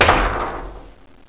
bust metal, debris

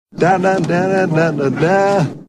dadadaaa

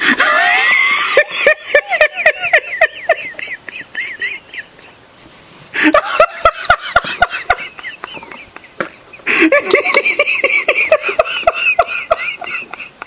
lmao

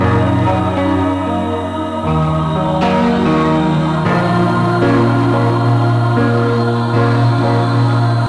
piano loop

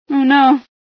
startle, female

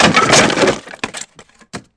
wood

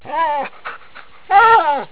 dog whine

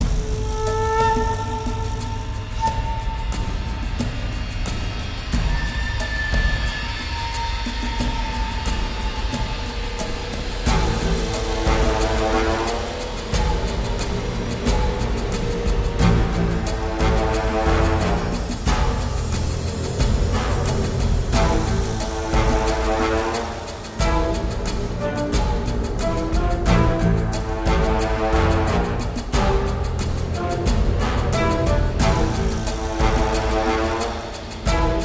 lost intro; sven; sc tropical

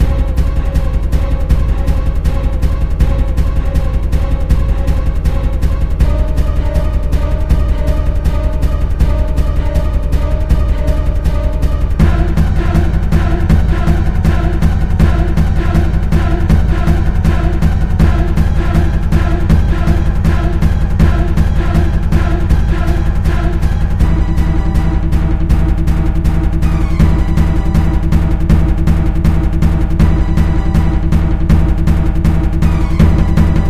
boss loop; sven; chungo; twitch boss

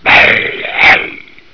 attack; custom monsters

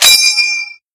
ping, garand

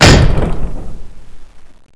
door stop

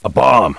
run; jc denton

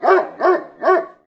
pseudodog idle

